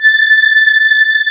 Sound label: CMI ORGAN